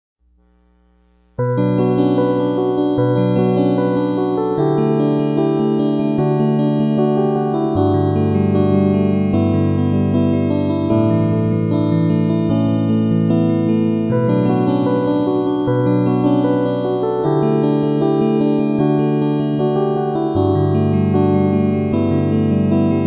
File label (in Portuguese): eletric piano